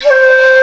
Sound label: enhanced delay shaku; tumbledemerald